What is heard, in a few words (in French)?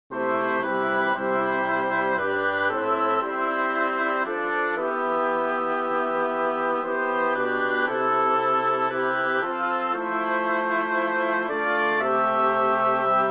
Extrait sonore généré sur MuseScore avec des timbres de bois; Durée totale : env 2min45; pour chœur mixte à quatre voix SATB; Pièce en attente de création